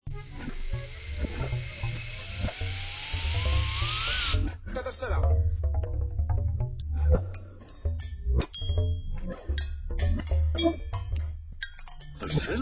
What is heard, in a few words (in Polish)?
Instrumenty